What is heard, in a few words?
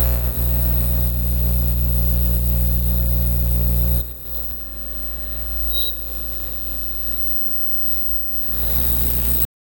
defective fluorescent lig